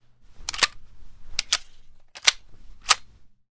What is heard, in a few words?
Mp5 Reload; zps; Weapons; Firearms